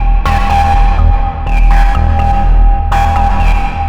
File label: bass